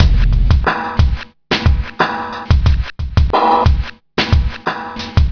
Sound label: Street Tip, R&B Drums